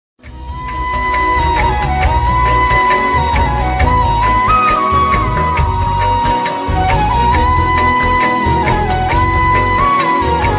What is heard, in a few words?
flute bgm ringtone; love story movie; naga chaitanya; sai pallavi